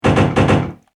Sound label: Machine Gun Fire; Machine Gun Sound Effects; Andrew Fleming